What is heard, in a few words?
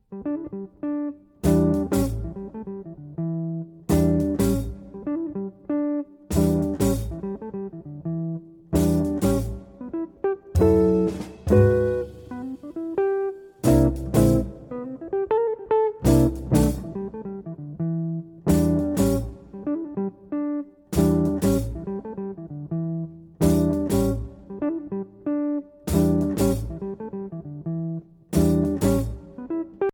Jingles